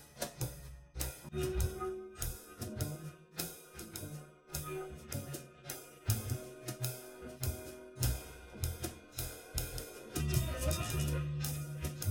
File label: Drums; ICASSP11